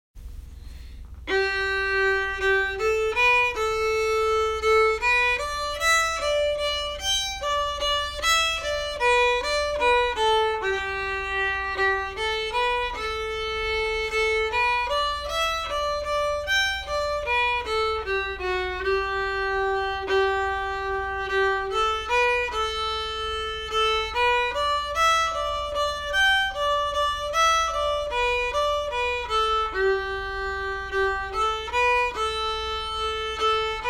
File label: kesh slow